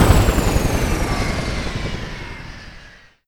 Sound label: Hind Shutdown; Vehicles; WarRock